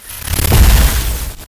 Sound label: big win change